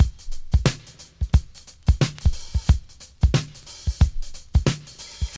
90 bpm drum loop b key, Free breakbeat sample - kick tuned to the B note. Loudest frequency: 870Hz; drum loops